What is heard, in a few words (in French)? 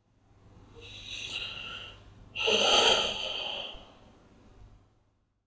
soupire; index des soupirs